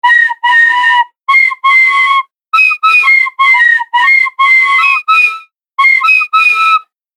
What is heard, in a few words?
Child Playing Whistle Loop; Whistle Sound Effects; Loopable Sound Effect; Andrew Fleming